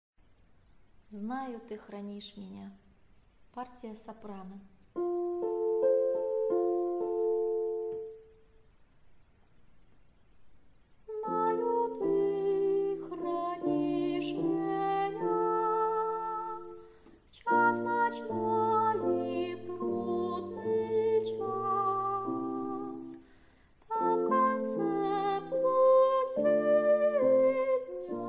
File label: soprano